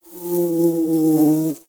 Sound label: Exterior Foreground Bug Flyby; Ambience; Warframe; Duviri